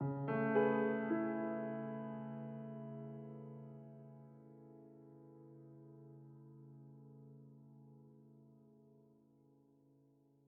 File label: Env Field Day Ground; the legend of zelda tears of the kingdom